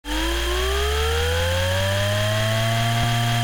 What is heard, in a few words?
laser minigun charge start